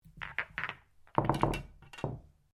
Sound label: Billiards Triangle Ball, Home billiards table; Pool (Sound Effects:Sports)